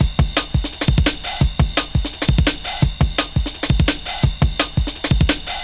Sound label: Kick Break, Drum & Bass; Fluid Dynamics